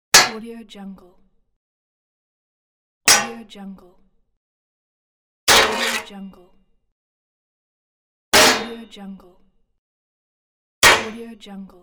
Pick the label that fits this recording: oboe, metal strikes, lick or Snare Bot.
metal strikes